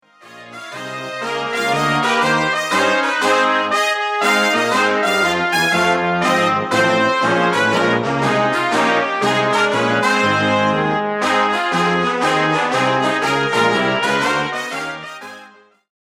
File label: brass